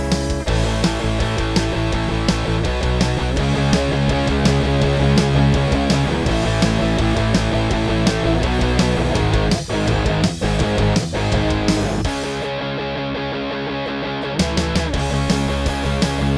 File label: Ampeg Sim Test